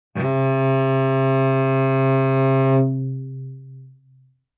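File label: Cello arco fortissimo sul G C3; MIS Pitches - 2014; Strings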